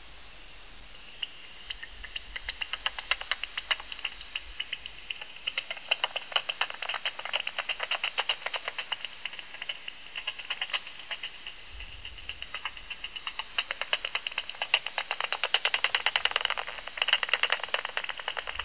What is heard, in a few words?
bat calls